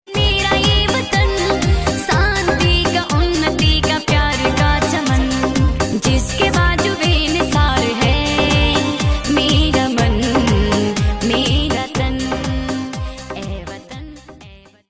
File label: Desh Bhakti Ringtone